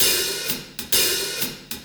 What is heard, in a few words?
130, Top Ends, Beats; Zero-G; Monster Beats